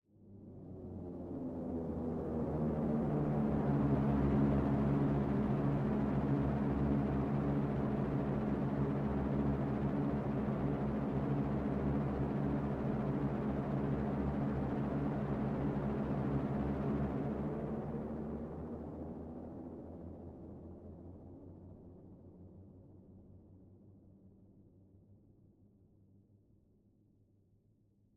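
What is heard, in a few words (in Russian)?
медленно нарастающий и затухающий синтетический звук бомбардировщика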